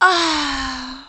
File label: SIGH